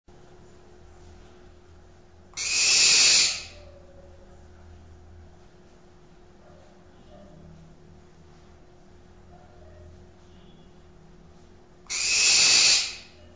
barn owl; birds

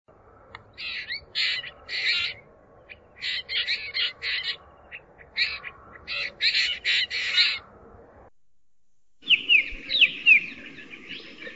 black drongo, birds

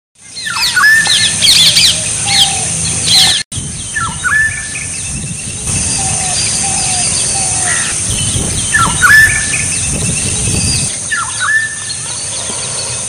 black hooded oriole, birds

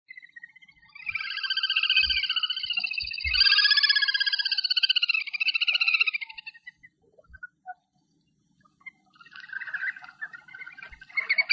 little grebe

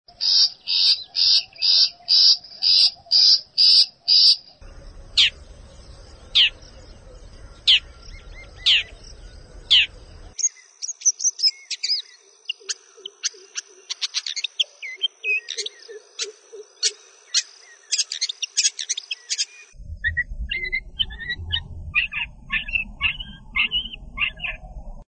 long tailed shrike

